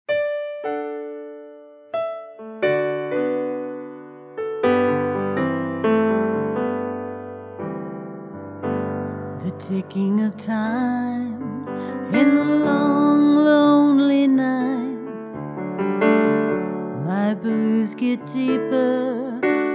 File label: Piano Mix